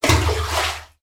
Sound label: water splash flooded bunker; sound effects one